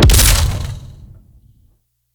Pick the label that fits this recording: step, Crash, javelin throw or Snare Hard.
javelin throw